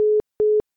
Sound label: Au Call Waiting, tones